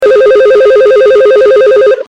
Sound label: Phone ringing classic